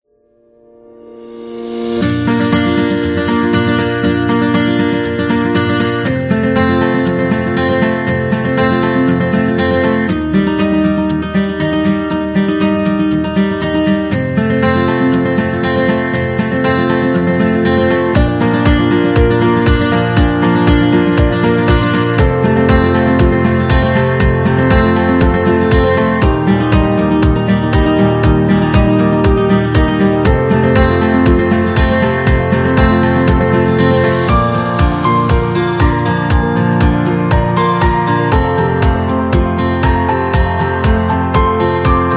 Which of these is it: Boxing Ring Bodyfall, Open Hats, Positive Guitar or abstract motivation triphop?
Positive Guitar